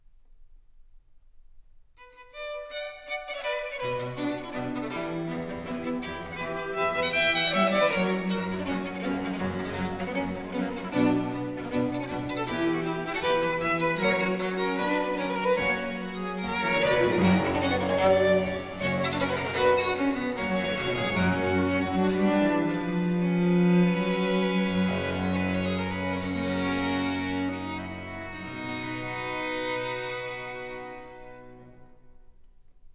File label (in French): Pièces de violes, Suite in C Minor